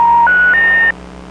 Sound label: Three Tones; PhoneSoundz 1.0; Phreaking